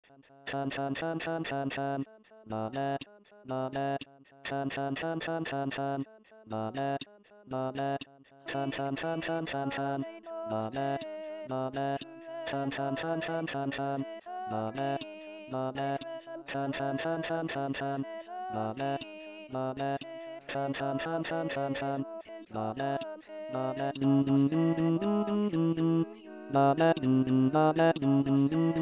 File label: tenor